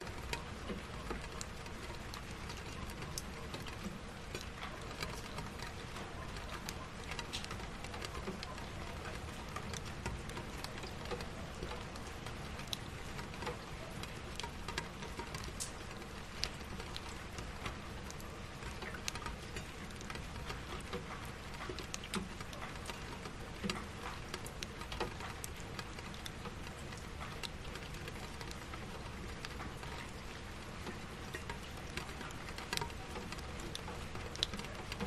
soft rain on window glass surface and empty bucket city hum background; traffic loop; Sound Ex Machina